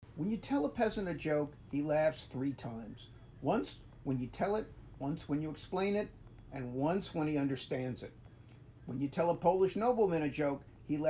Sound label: Laugh Track; Joke